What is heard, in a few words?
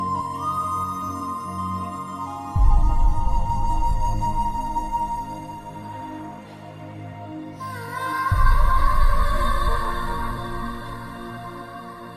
Sad BGM